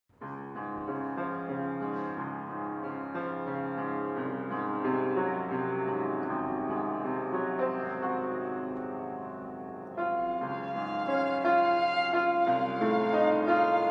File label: Instrumental Duet; Sermon Clips Pastor Mejia